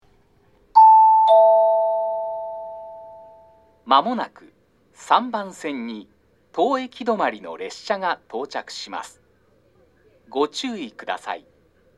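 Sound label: Toyohashi approach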